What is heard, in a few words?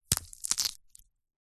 horror gore small subtle bone flesh break rip